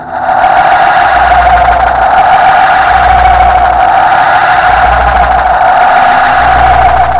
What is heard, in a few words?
ufo; yuris ufo